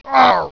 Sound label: strike; FOXBEAR; DIRECTX